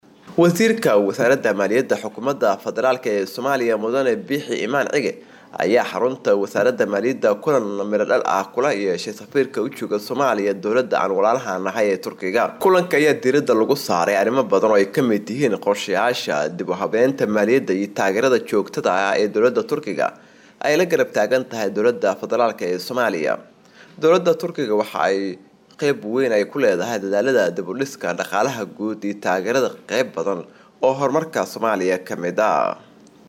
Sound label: sax